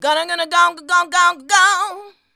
"ganagege", shouts; Best Service; Hyper Dance

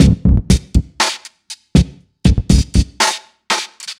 Subtractive Beat

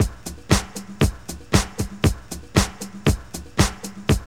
DISCO LOOP; Zero-G; Funky Elements

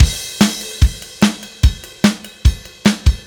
Silos Beat Crash, Indie Rock; Sonic Foundry (Sony Creative Software); Indie Rock Anthems; Session 11 - Silos

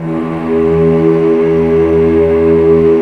STR, Vcs p Amb

